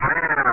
Oni Screech; NinjaBoy

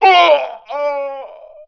death; PC Gamer IT CD 41; Daikatana; superfly

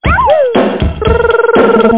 brush; Lucky Sounds

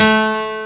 piano; ac piano; pianoa2

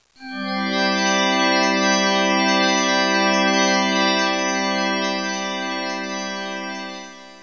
organ

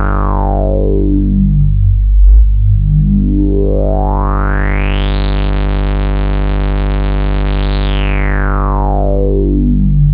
modul, synth bass

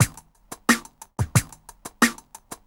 BACK BT, Hip Hop, Backbts; LiveAct Pool

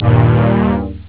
brass

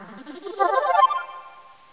Buttfly; max

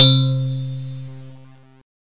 diphone, l, keyboards; Zoom - Release 2; Active Software

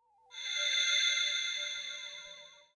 hi tech trans

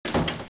slam

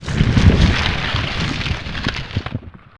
earthquake; wiearth

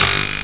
spring

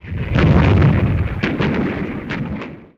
multifire hvy; TOAWDEMO

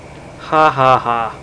hahaha; Sound Library 2000; Wayzata Technology; People

